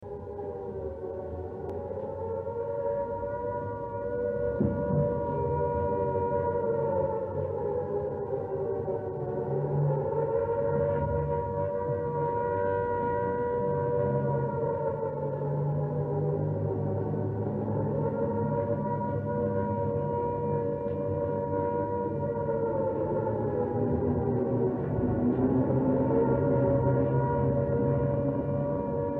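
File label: ww2 British air raid siren; uk air raid siren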